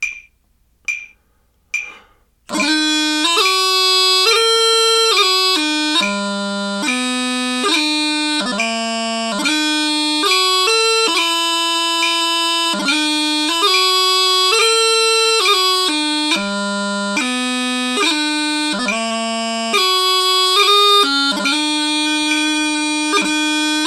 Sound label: 70bpm, Chanter Recording